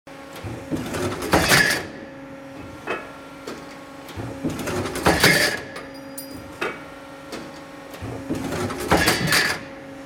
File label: cutting machine